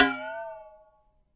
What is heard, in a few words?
bendy metal hit; Weird and Interesting Hits; single hits